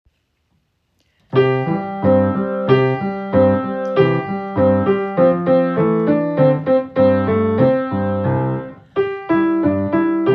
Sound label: piano; 鳳陽花鼓